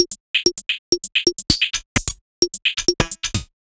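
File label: Odd Glitch, 130 BPM, Percussion; Sonic Foundry (Sony Creative Software); Underground UK House & Electro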